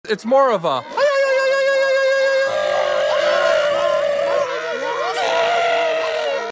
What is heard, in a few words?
Scream; Its More of A Tom Lizard Scream; WHAAAAT